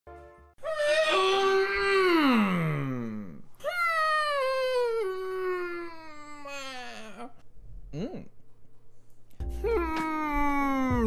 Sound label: HmmMMm